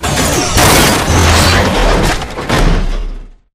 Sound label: melee chest punch; Titanfall 2